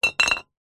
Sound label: bottle 660ml glass beer empty knock over on concrete; foley; zapsplat